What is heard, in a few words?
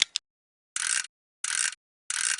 watch screw